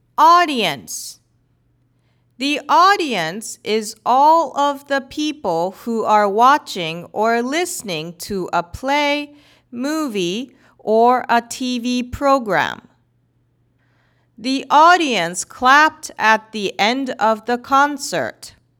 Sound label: audience